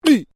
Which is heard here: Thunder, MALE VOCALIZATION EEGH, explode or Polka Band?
MALE VOCALIZATION EEGH